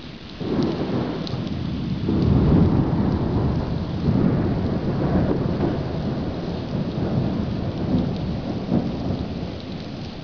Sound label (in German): blitz weit